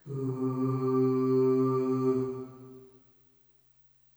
Ooh Short C2 Short Reverb, Harmonic Building Blocks; Sonic Foundry (Sony Creative Software)